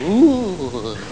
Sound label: random wall; Dynamite